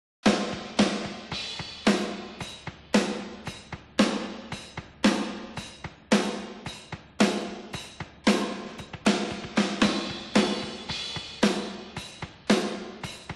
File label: snare toms; multitrack